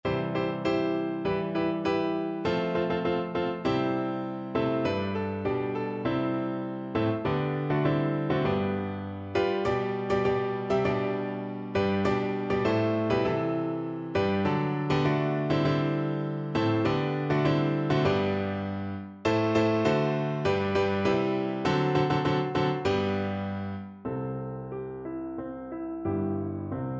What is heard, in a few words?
senegal national, piano